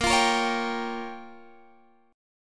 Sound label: A sharp Minor 6th, down, Guitar, Standard; strummed